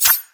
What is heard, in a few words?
crit attack; LoL; fiora